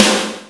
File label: snare; Breaks N Beats Sound Kit; Beatz Kit